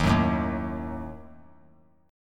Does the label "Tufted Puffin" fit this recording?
No